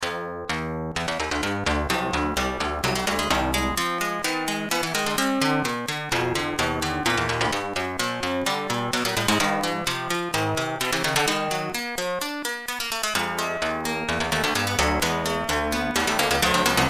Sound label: Acid Bach